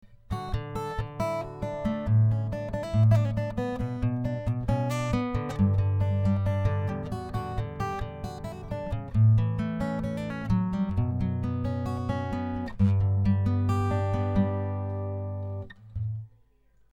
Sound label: guitar